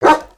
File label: dog bark